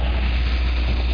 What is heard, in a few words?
Pencil; SketchBook 2.1